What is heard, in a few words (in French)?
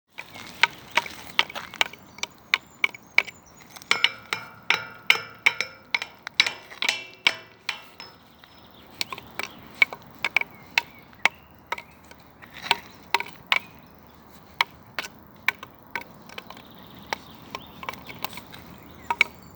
enfants percu bois grille metallique